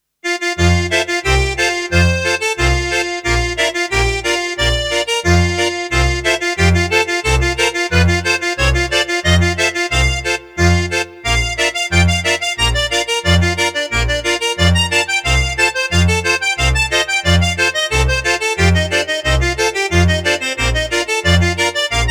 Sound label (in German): Franzoesischer Schottisch